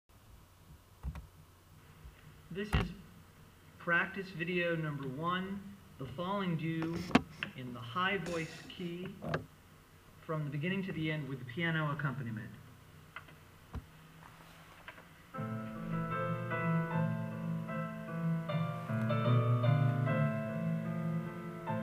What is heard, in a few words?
the falling dew high voice